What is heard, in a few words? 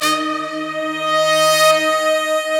big brass, Slow Big Brass